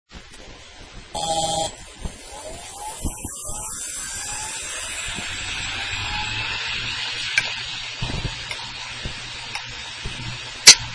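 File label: wd 5000gb slow clicking spin down, hard disk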